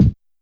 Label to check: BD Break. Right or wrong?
Right